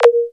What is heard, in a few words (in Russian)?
knopka suhaya zvonkii visokii rezkii blizkii